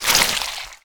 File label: dismember blood; weapons; dota; pudge